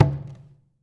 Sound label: KUFI, Ritual Drums; E-MU Producer Series Vol. 7 – Old World Instruments